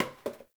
Janitor Paper Towel Drop; Warframe; Ambience; NineteenNinetyNine

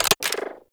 Arch Gun Alt Mech; Warframe; Weapons; Archwing; Hildryn

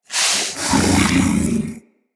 Vocal Breathe, Hunting Critters; Characters; Predasite

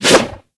Whoosh, weapons; Warframe; Tenno; Ankyros; Prime Ankyros

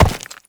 Step Rock; Warframe; Uriel; Movement; Steps

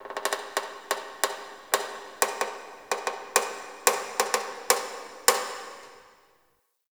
Metal Creak Small; Warframe; The New War; Zariman

